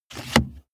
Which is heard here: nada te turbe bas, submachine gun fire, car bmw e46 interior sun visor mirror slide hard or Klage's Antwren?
car bmw e46 interior sun visor mirror slide hard